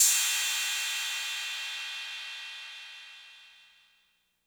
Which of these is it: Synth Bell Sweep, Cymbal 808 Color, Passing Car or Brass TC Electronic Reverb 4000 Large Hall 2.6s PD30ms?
Cymbal 808 Color